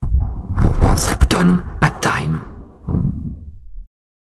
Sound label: jingle